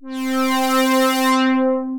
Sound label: Memories Pad, Wavetable, Synth Pad